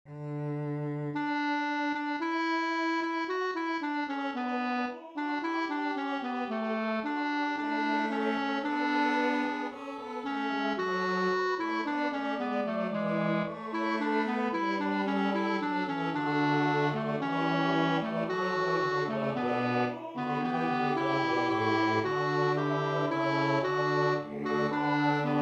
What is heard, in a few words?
Tenor